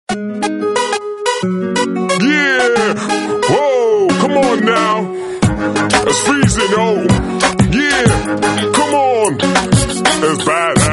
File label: Hip hop; Samsung; SGH-A257